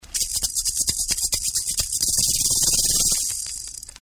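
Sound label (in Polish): Marakasy, maracas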